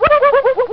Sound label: WHUHUHUHUHOO !